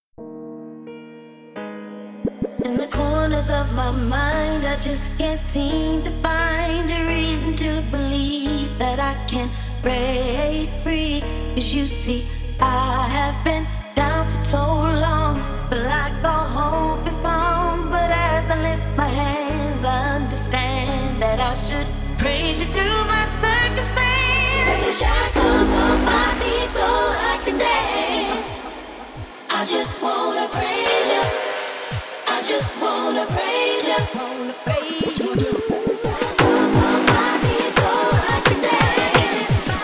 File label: Drum & Bass; Mastermix Crate 060